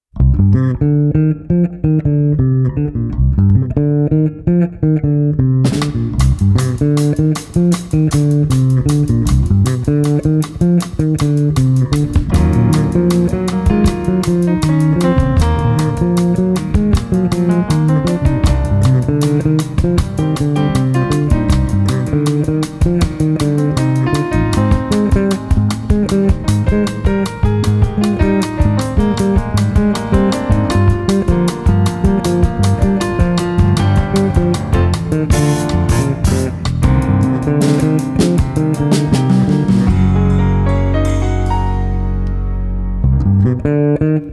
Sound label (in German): Der mit dem Bass tanzt